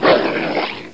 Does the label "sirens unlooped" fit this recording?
No